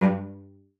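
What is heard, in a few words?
CELLO spiccato G2; Xsample VOL-5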